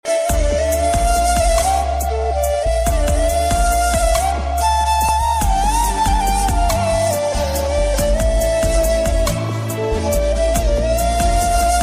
drama ringtone; deewangi drama

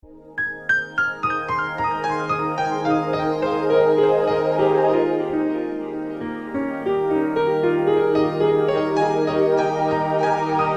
alarm ringtone; android apple

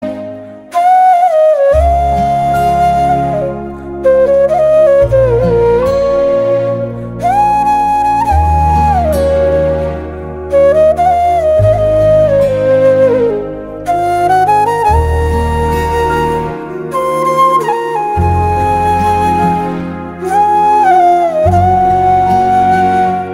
flute ringtone

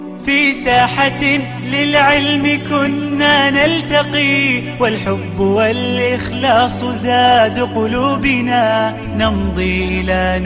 islamic naheed ringtone; islamic ringtones

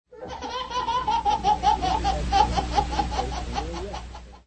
best laugh in history

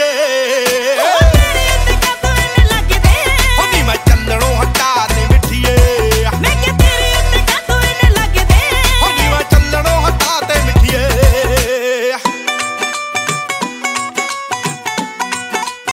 music ringtone

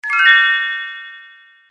click music message tone ringtone

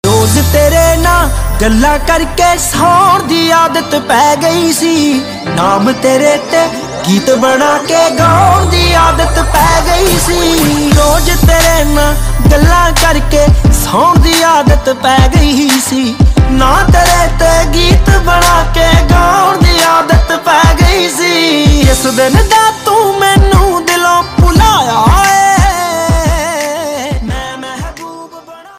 love punjabi music ringtone

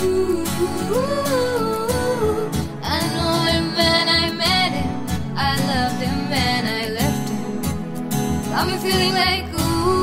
bollywood music ringtone

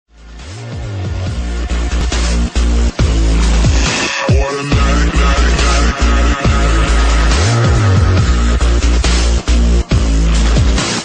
bass remix

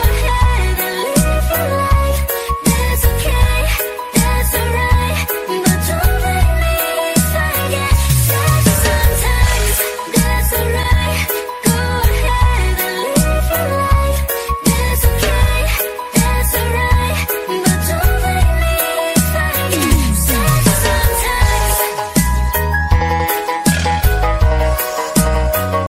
new reggae english ringtone

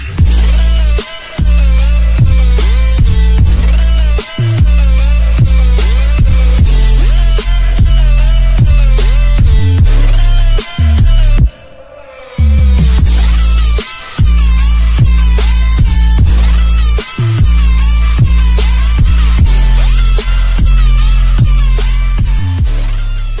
sad music cool ringtone

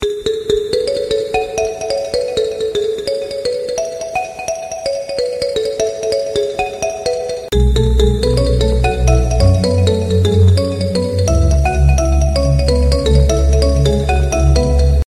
galaxy s5 epic ringtone

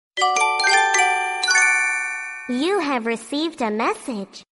received a message phone tone ringtone

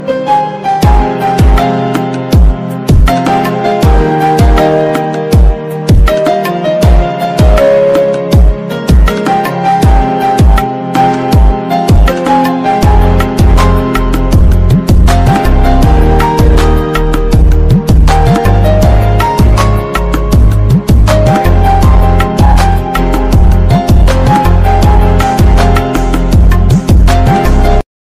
music ringtone, bollywood ringtones

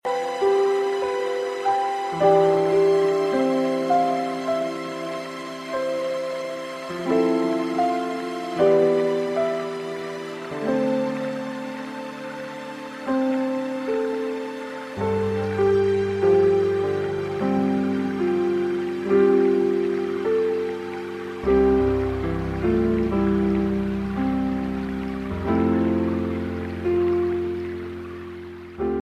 peaceful sea waves musical tone ringtone

